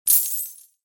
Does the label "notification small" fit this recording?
Yes